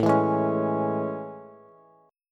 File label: A Major 7th A, Bass; AM7; strummed; JGuitar